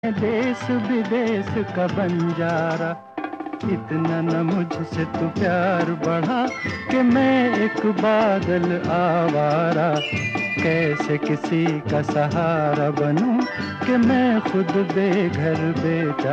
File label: Ringtone